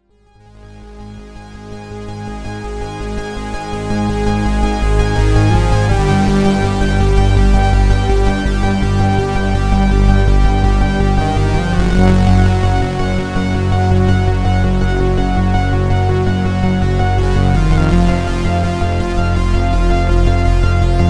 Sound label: ARP2600 Orange Sawtooth